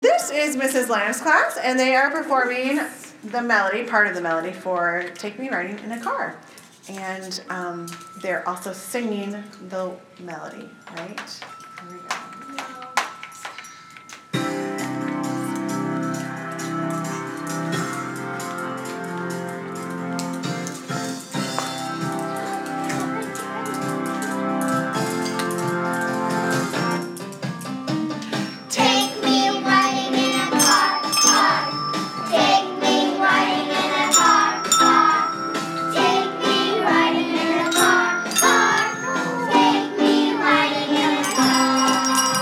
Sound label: car song